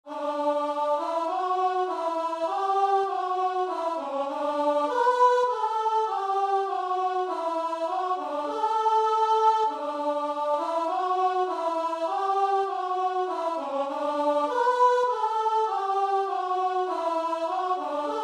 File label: glorious things of thee, guitar and vocal